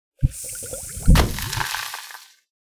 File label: acid splash 60ft, cantrips